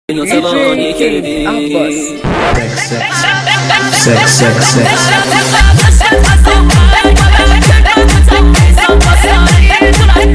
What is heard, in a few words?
Remix